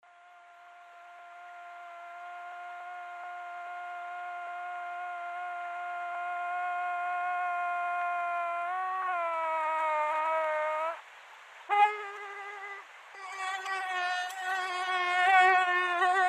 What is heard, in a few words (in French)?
moustic; carnaval